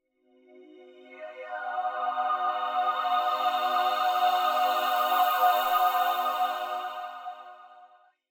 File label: Pad; Dubstep